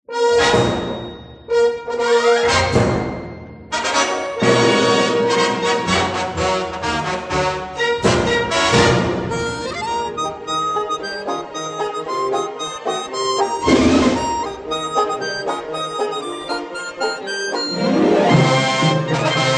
Main Title instrumental alternate

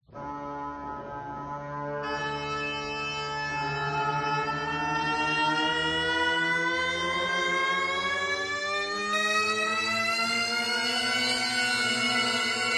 bagpipes drone

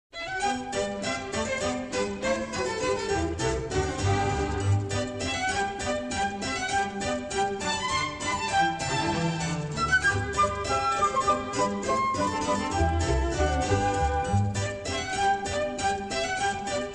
BOURREE SOURCE MUSIC

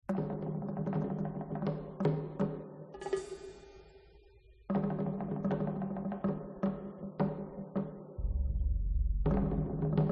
Drums The Market

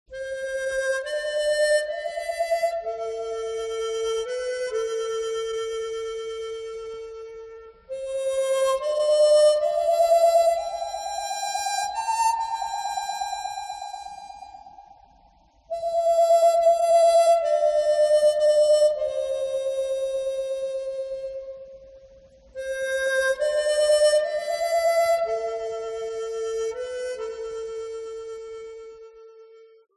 Navajo Harmonica

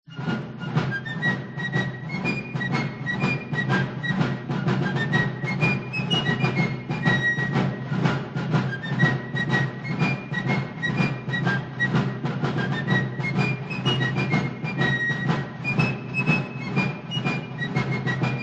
fife and drums corps inspection

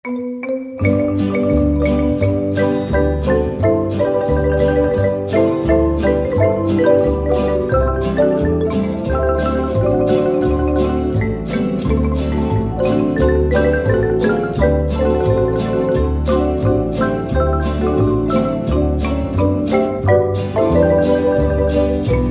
Mexican Street Music

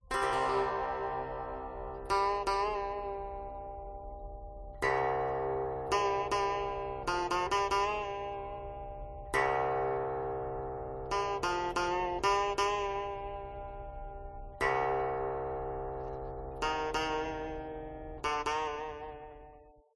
Indian Music Sitar

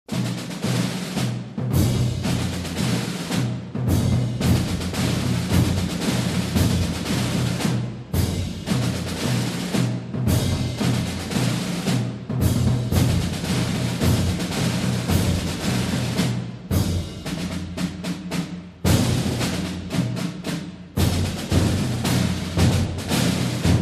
drums montage

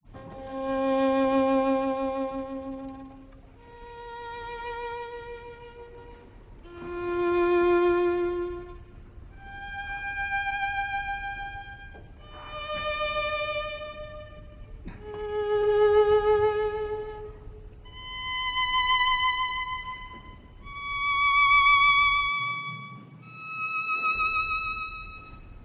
Mobiles for Violin and Orchestra; concert

